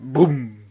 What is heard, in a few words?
boom